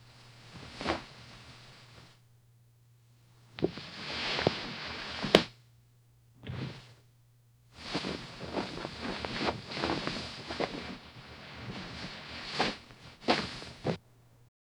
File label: soft rustling of fabric, clothing